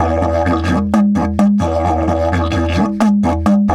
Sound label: E 130, didgeridoo; Big Fish Audio - Didgeridoo and Other Primitive Instruments; 130BPM